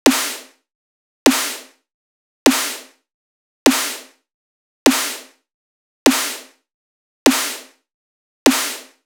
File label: Drum Loop - 100 BPM Snare, Midtempo; Deadlock; Cymatics; ANALOG EVOLUTION Beta